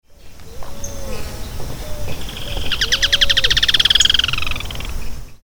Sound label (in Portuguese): Certhiaxis cinnamomeus Curutié